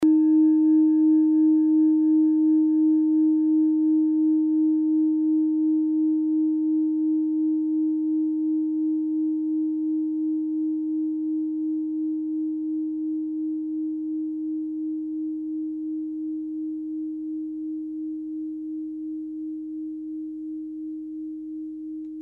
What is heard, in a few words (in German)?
kleine klangschale, Nepal; kleine klangschale nr 33 nepal planetentonschale wasserstoffgamma